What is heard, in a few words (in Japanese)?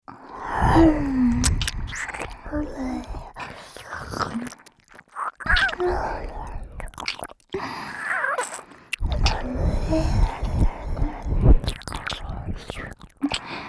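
耳舐め＆キス; カレン; 葵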